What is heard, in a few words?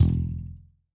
Foley Spring Thick Spring